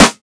natural acoustic snare f sharp key

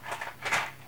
multiple matches

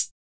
original hat sound g sharp key, closed high hat

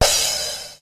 roomy drum crash sound e key; Cymbal; drum sample; one shot

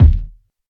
big kickdrum sound d sharp key

